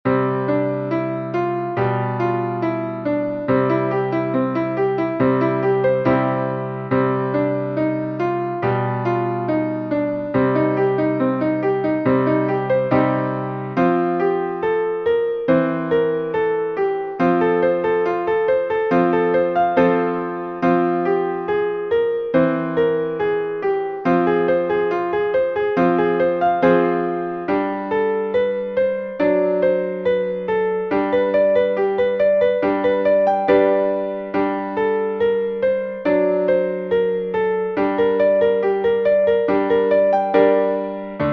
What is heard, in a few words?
Penta-Scales And Pentachords - Majors and Minors